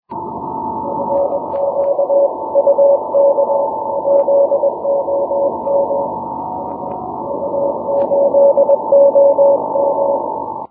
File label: 6m cw